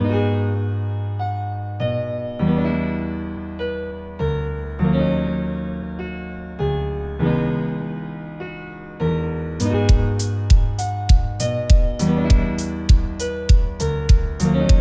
chilling funk nights 432 hz

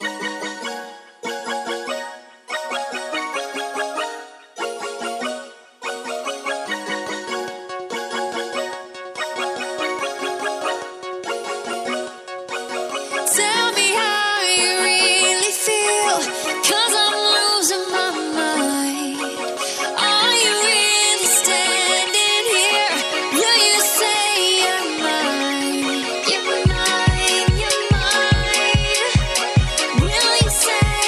singing power music 432 HZ; ITS MINE

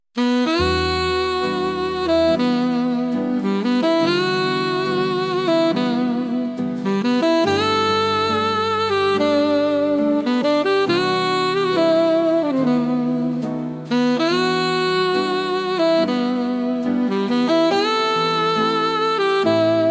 saxophone horizons

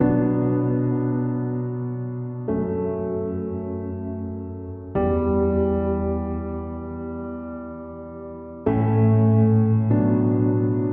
Mystic Nihon Melody; serenada kwitancej wisni

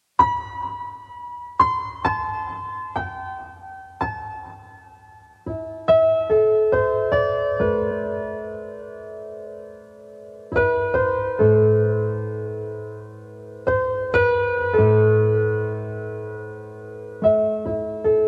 piano music 432 HZ; MOWILEM CI JUZ

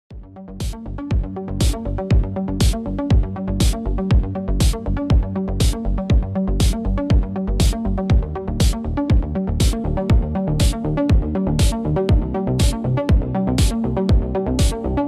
Electronic Impulse

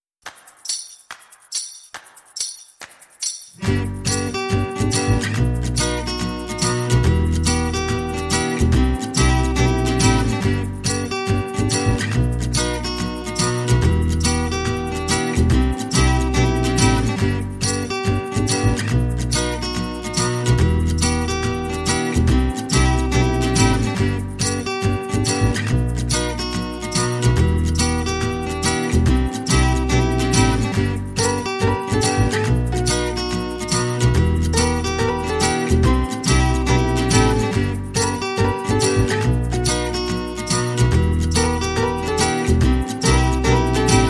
acoustic vibes; 432 hz

